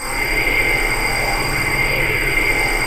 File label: BELLOWS; Pro-Rec - Synthsonic